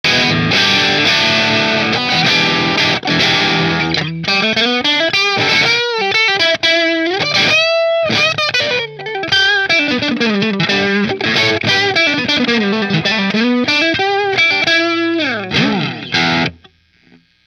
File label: Robin Ranger position 4 marshall, guitars